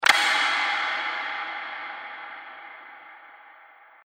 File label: Ambient Click Sound